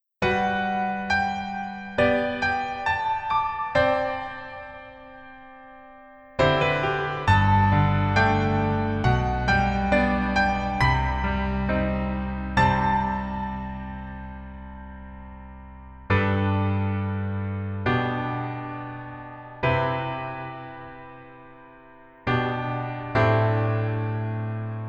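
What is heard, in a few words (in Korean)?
Piano version -1키; 하이바이, 마마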